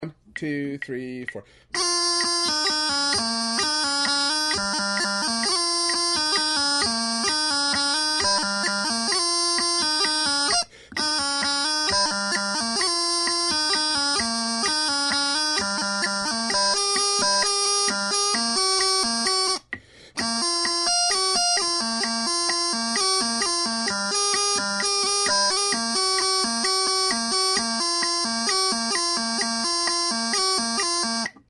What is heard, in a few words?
Steam Train, bagpiper